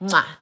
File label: kiss; Google; Google Sounds; Sounds of Pride